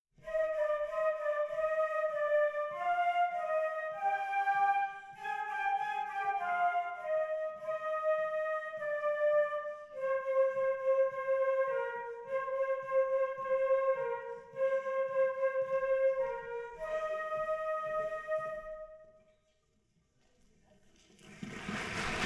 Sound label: Flutes The Nutcracker